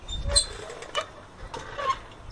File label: rusty swing; Sound FX Real Life